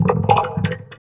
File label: VOX VOX, Electro Vox